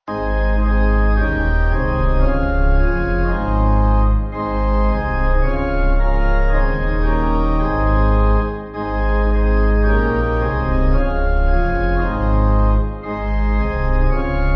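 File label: Pipe organ, church music backing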